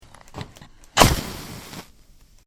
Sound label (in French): allumer allumette